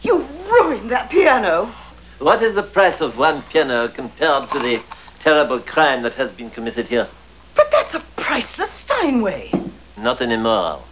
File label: piano